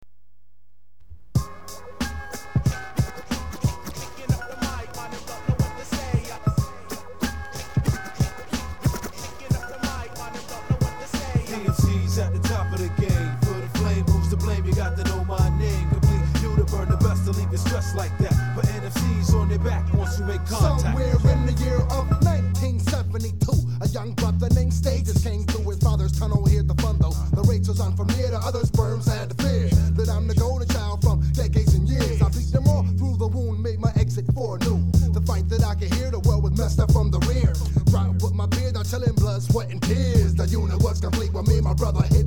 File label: hiphop